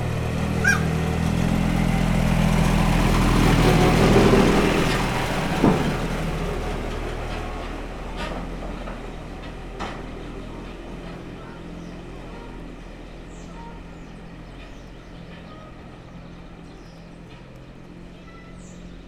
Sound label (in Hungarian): elhaladó pótkocsis kistraktor, páva; debreceni zoo 2012